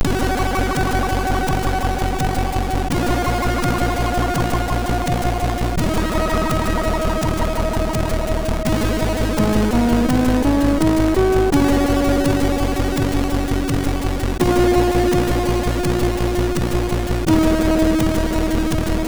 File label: Samba, MOS6581R4